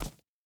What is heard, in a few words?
boar footstep dirt hard; Rust